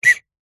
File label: foley whistle short toot